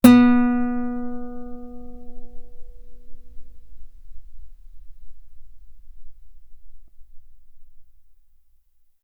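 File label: Guitar ff B string B3; sul B; OmniPower4296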